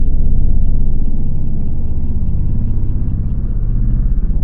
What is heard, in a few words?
dark aura loop; Wizardry; ebwizardry